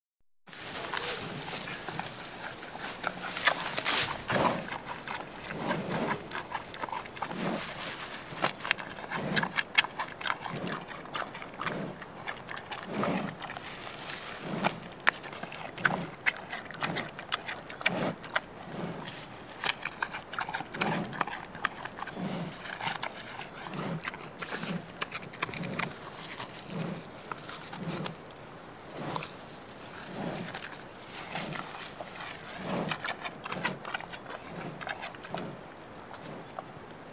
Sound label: Panda Eating, Breaths. Steady Eating Sounds With Breaths And Leaf Movement. Close Perspective.